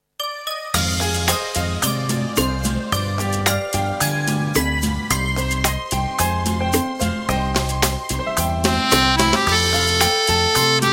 accordeon bolerito

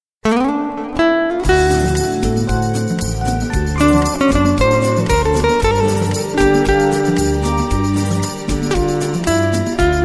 rumba

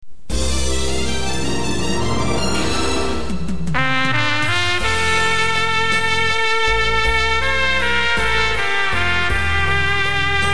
trompette

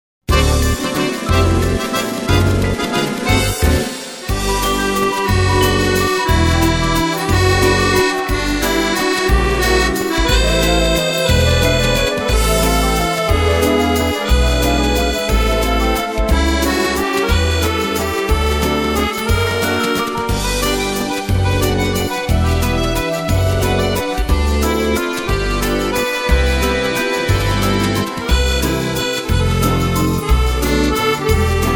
valses viennoise; medley 2 de 3 valses viennoise

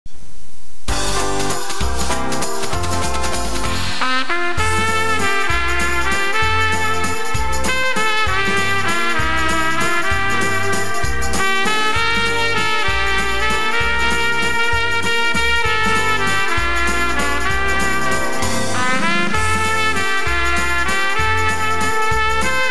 valse trompette

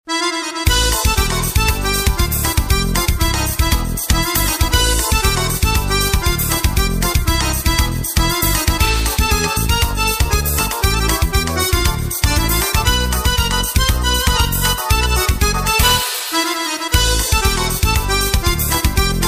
samba

